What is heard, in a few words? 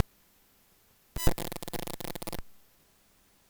2 Second Delay Release; Digital Cameras; total remote transmitter alternative; need for shooting hdr exposures on the fly